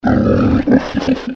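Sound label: wild boar; Краткие сведения об основных охотничьих животных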